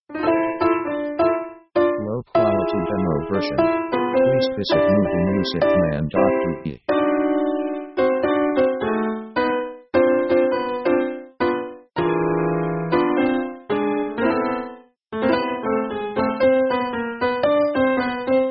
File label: piano swing sketch; orchestra themes